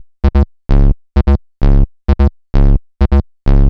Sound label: Galloping Bass, EDM; Sonic Foundry (Sony Creative Software); Afterhours EDM; Ibiza Underground